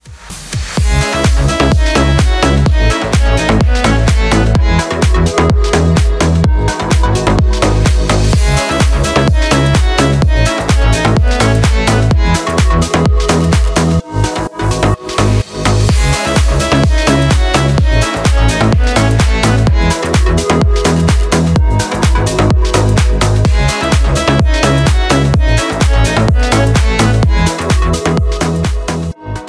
instrumental version

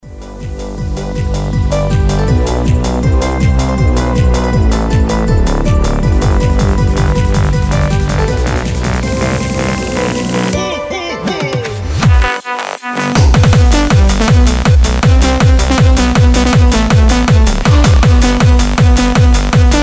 jingle bells techno remix

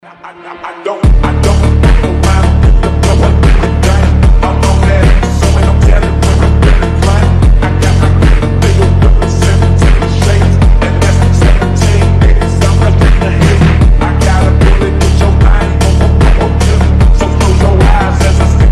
slowed reverb

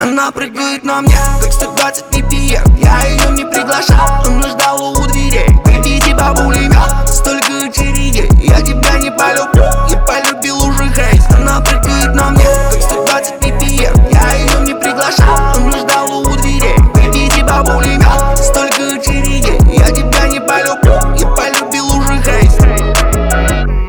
120 bpm

